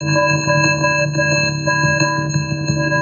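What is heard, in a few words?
pop music simulation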